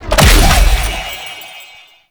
Treasure Box Clone Spawn; Warframe; ManInTheWall

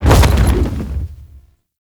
Horse Jump Heavy Double; Vehicles; Foley Actions; Warframe; Duviri

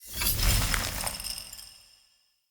Feed Action; Objects; Thawtide

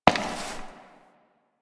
Step Ice; Warframe; Koumei

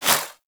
Throwing Star Whizzby; Warframe; Weapons

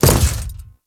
Step Default, Footsteps; Warframe; Grineer

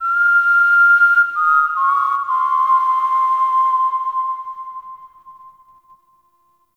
Whistle; Warframe; The New War; Zariman

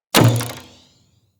Dendrit Bow Shot Charged Twang, Bows; Weapons; Player

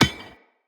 Orb Cannon Drop Impact

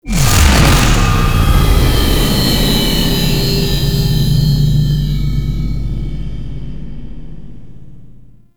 Vessel Searchlight Whoosh; Warframe; Tau; Sentient Vessel

